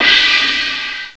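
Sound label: CRASH CYMBAL; CYMBALS; CMI Sound Library Rev 1.3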